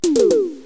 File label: hihat